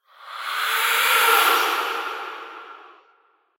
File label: Ghost (death); Phasmophobia